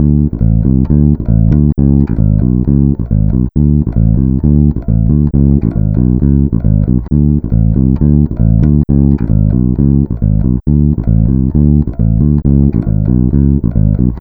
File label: Bass, Electro Lounge; Sonic Foundry (Sony Creative Software); 135 Funky Air